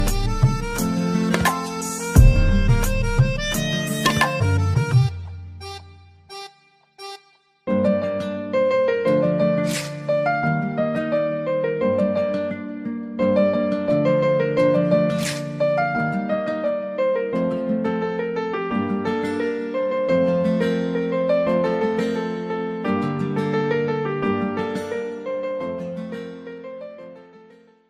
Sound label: english romantic ringtone